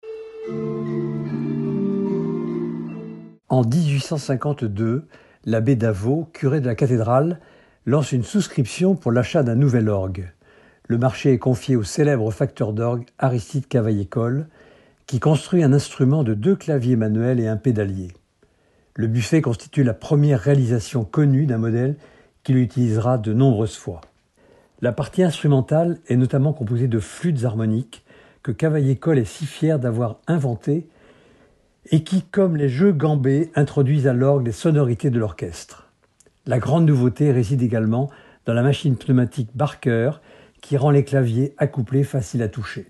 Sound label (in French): Les grandes orgues